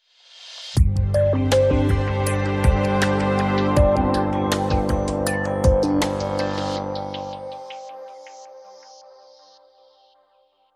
Chill Sting

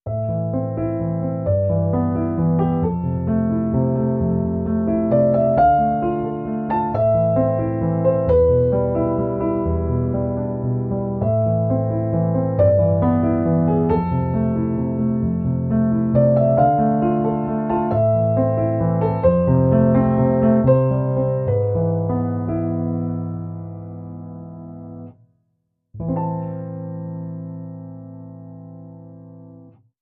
Piano Waves

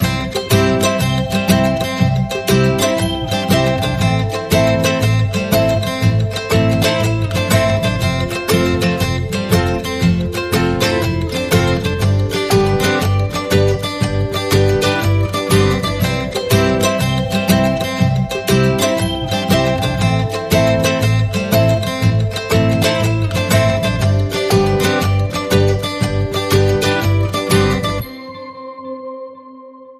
Happy Christmas Ukulele, No Drums; 30sec

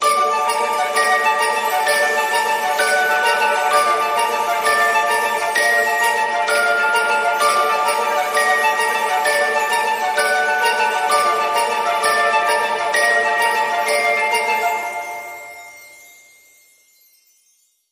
Magic Cheer Mysterious Atmos

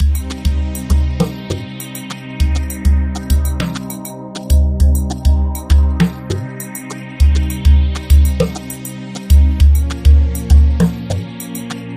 Drifting Pad

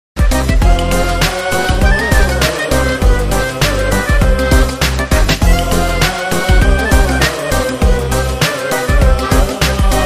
Bar Mitzvah (Modern Remix)

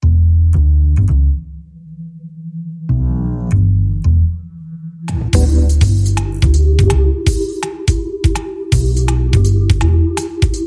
ChillSwing

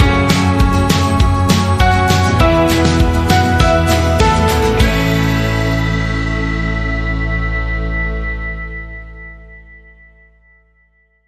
Sting No Vox

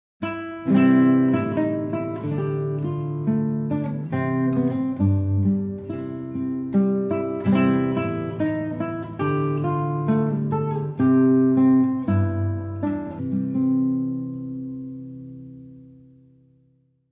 Solo Guitar

